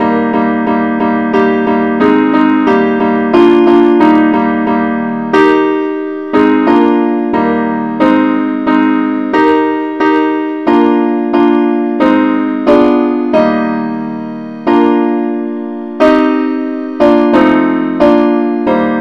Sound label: simple acc key ees; Father, we praise Thee, now the night is over; christe Sanctorum